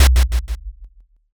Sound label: taiko